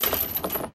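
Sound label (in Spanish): CADENAS DRAG, chains drag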